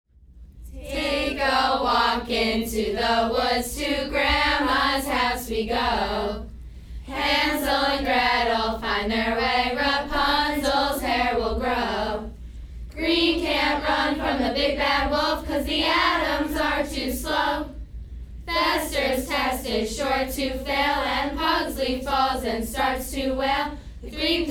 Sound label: cheers